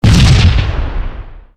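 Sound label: frag grenade explosion; weapons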